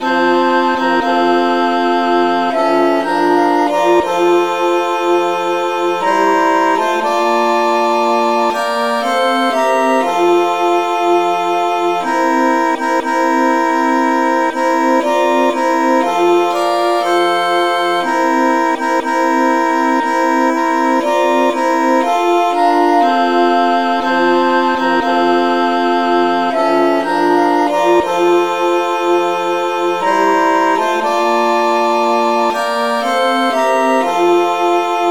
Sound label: traditional keybes